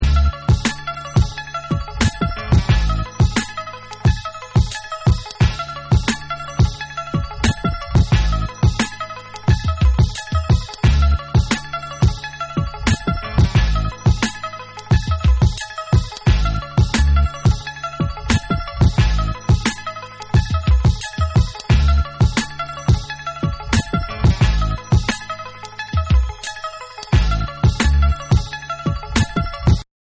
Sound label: Instrumental